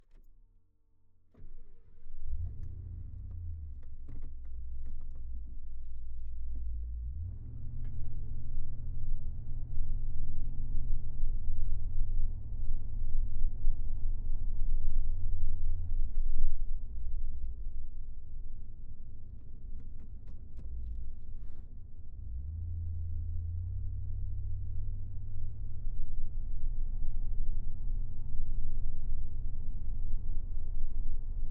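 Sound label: Ford LTD onboard medium startup driving forward reverse shutdown interior; Ford Ltd Crown Victoria 1990; MKH8020; Pole Position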